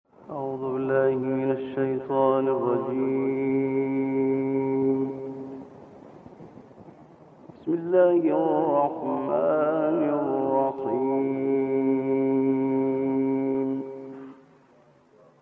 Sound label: mujawwad